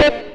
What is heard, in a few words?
acid synth (D) chord; Sonic Foundry (Sony Creative Software); Ugly Remnants VOL-2